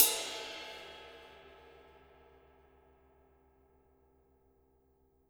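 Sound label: Jazz kit ride, Drums Acoustic; AKAI; JAZZKIT 2; 90s sample CD; USB Soundscan vol.10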